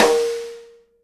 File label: snare RIM RING, Live Snares